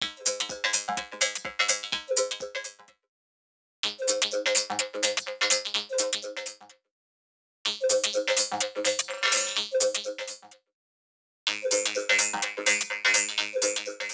Electro 126bpm; X-Static Goldmine 5

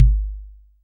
Kick, Clavia Micro Modular

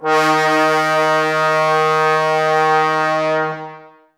BRS TBS D#, Woodwind Brass Ensemble, Brass; Miroslav Vitous - Woodwind Brass Ensemble

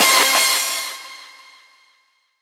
FXCRSH; neuro; Stanza

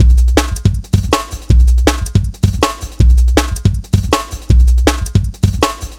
160, Drum Loops, Drum & Bass; Total Drum&Bass

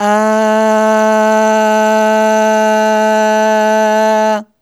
Aah Long A2 Dry; Harmonic Building Blocks

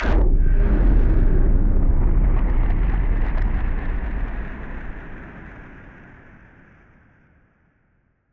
Noise Bomb Rumbler; musicradar; bomb factory

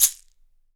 CAXIXI, SHAKERS; Big Fish Audio; Ross Garfield Drums VOL-2

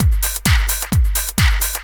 drum 130 dance, drumloops

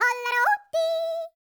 YODEL, World Voices

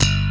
SLAP BASS, JV 1080; Pro-Rec - Synthsonic

